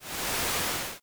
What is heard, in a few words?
slide